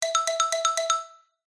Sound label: cartoon xylophone flutter; zapsplat